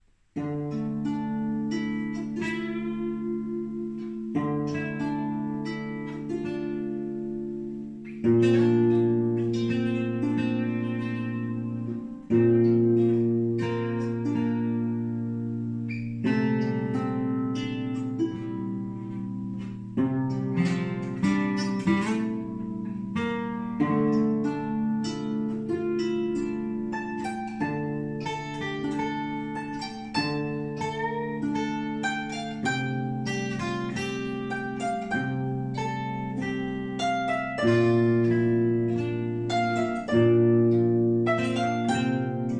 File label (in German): Elfentanz mit Gitarre